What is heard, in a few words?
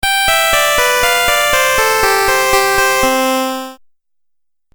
Game Music Phrase, Video Game Sound Effects